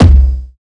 tom burnt circuit; Drum One Shots; Slate; SD MIDNIGHT Sample Pack

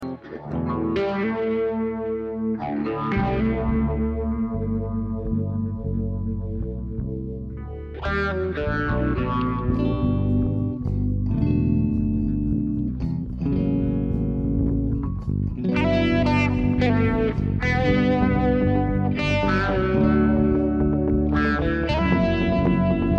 Guitars and Bass

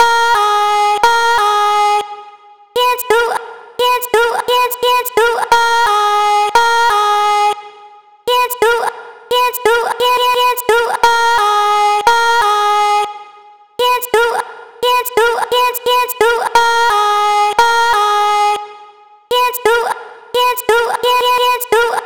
Vocal chop, 87 G#m; DirtOff; Slate Digital Omnivox Vocal Sample Pack; Chops

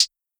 hat pitched, Closed Hat; Hip-Hop; Drum One Shots; SD CAICOS Hip-Hop Sample Pack; Slate

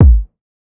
kick trip; Drum Sample Pack; One Shots; Slate; SEISMIC

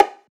perc clean; Drum One Shots; SD MIDNIGHT Sample Pack; Slate

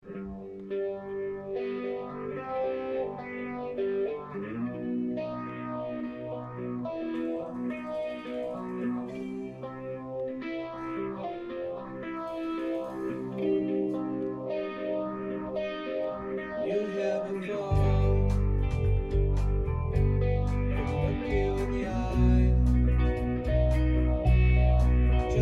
Room Mic

